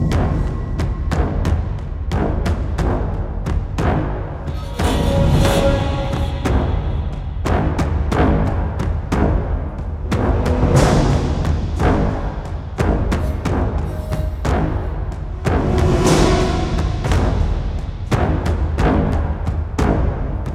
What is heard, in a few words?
Action, Loop, 180BPM, Classic Horror Sci-Fi Music Pack; Dead Ark